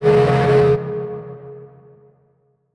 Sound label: Transition Feedback Distorted; Production Element